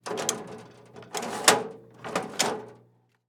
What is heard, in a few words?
X-Ray Machine; Medical; Blastwave